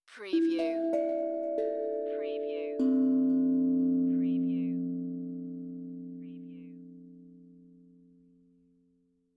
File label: Tongue Drum Meditative Tone; beanstalk audio